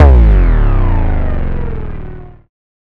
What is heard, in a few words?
Bass Drop; SoundsCrate